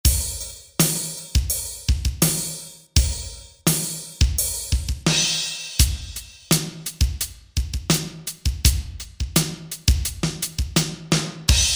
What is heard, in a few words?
Ambient, Korg MSC-3S Drums